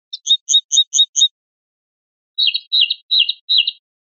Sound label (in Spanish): rep primaveras, pardillo